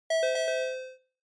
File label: Quiz Ding Dong Fast